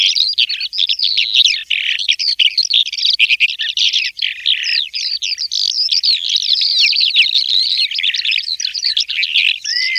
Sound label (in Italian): allodole, birdsound